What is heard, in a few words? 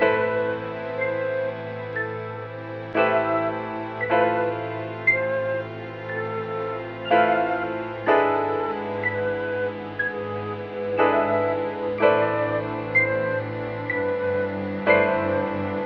flute and piano, Sad